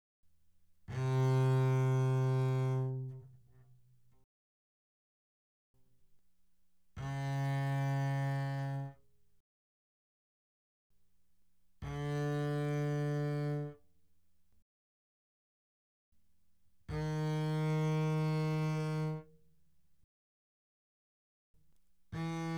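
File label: Bass arco fortissimo sul G C3 B3, doublebass; Strings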